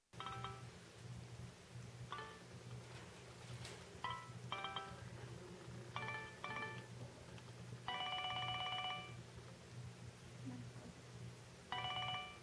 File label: nokia 2610 ringtone clock alert